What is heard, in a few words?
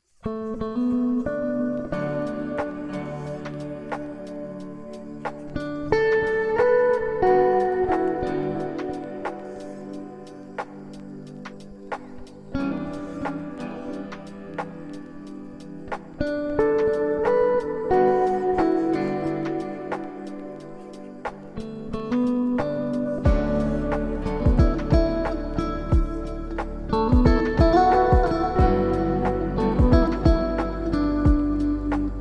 Instrumental Lo-Fi